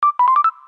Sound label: call connect; Samsung SGH-T369 Linx